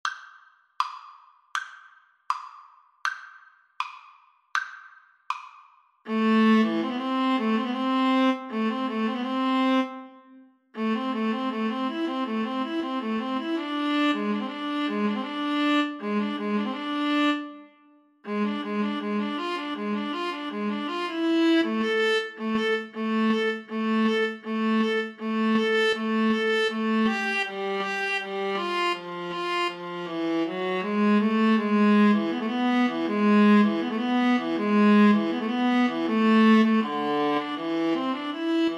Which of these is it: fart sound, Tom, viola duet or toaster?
viola duet